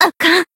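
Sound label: Voice damaged, Japanese; hoozuki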